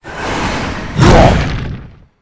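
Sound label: ice guardian attack, monster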